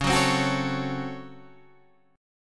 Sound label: C sharp Major 7th Suspended 4th Sharp 5th C sharp, Guitar Half Step Down; chord